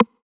Rim 909 analog

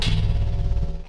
Granular

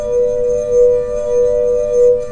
Glass bit

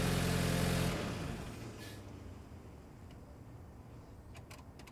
Car Engine Turning Off Distant

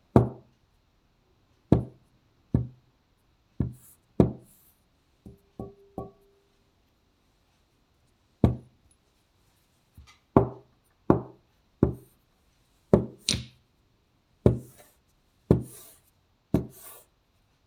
Chess Foley

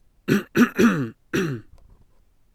Clearing throat

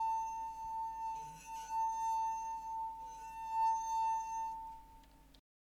Glass High Pitch Ringing

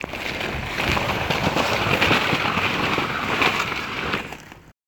Dragging A Bag

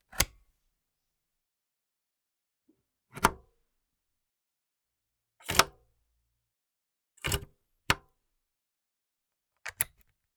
Electronic Devices Plug In

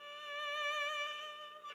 Midlength Vibrato

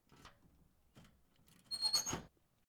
Old Metal Lock

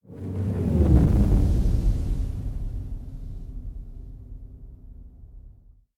Low Fast Passing Whoosh

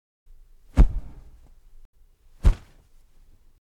Swing

